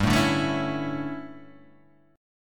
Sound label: D Major 7th Suspended 2nd Suspended 4th